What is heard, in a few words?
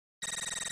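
Computer Read Out High Pitch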